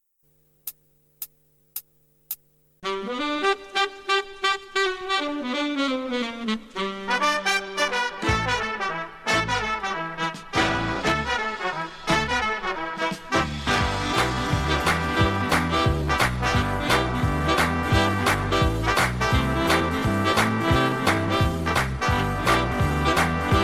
Ballroom 40s Swing, G; backing track

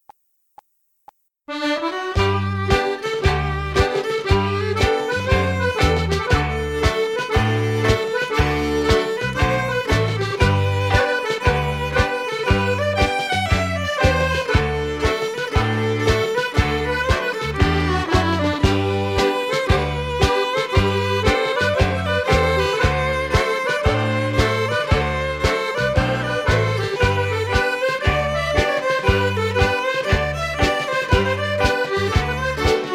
Irish Ceili Set Dance G

